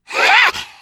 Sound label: spitter lurk; voice; idle; szf; player